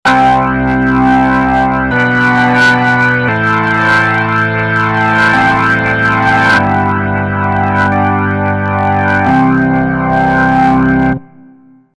Crumar Mojo Desktop Deep Rock

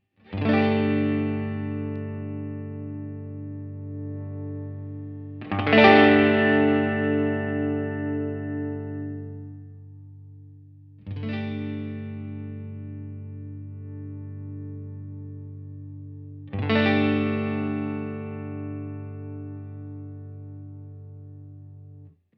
Tone Tweaker Mid; Carl Martin

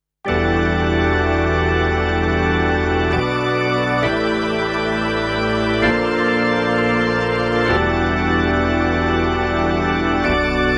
Kurzweil SP7 E. Organ Mix

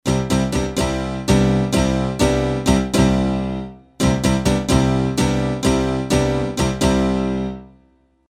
M1 Piano

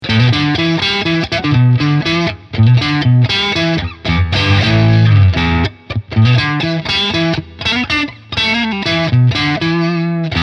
Fender Tone Master FR-10 Plexi

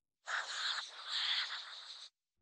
Whisper

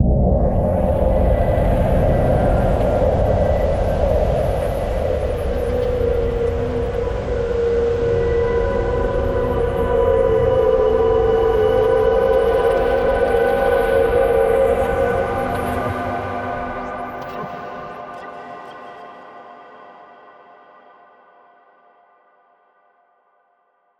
Scenic Hybrid Instrument Double Layer; Reason Studios Reason 11

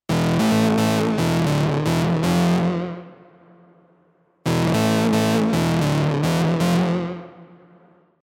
Mini Grit; Arturia V Collection X Mini V4

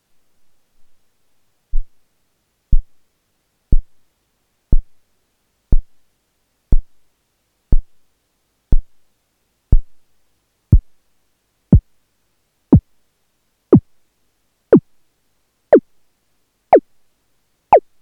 Simple Drum; Erica Synths Perkons HD-01

